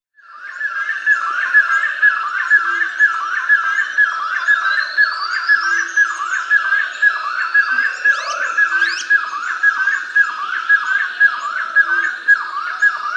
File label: Rufous-Breasted Wood-Quail (Odontophorus Speciosus), Andean Birds; S. America